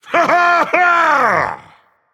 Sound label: Yay, Dragon; fafnir